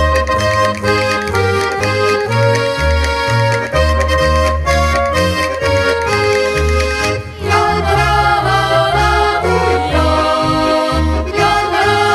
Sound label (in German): Bass; Rita am Bass